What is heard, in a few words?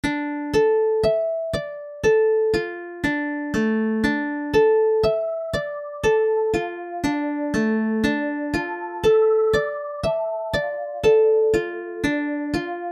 Piano Test